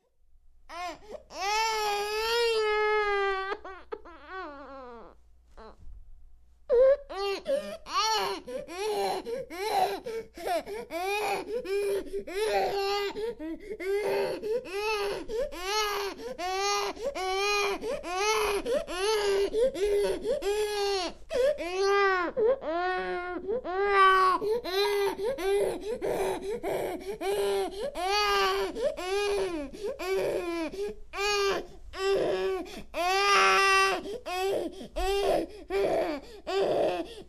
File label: Toddler Crying